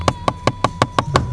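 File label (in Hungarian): ronda hang, Peugeot 207; totalcar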